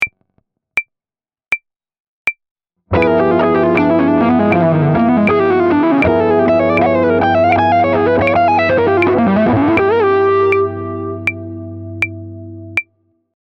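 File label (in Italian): Lick 4, SCALA DIMINUITA SEMITONO-TONO lick, Jazz fusion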